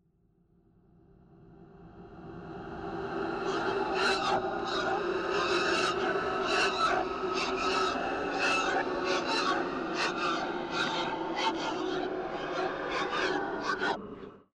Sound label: screeching monster, scary sounds